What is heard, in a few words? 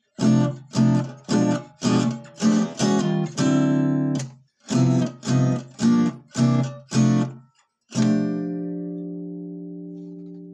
little turnaround; daddario gypsy strings